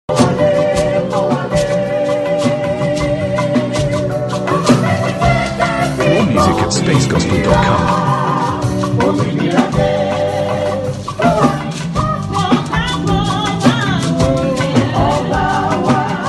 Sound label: Choir REHEARSALS